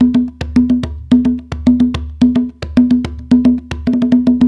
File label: Udu Calypso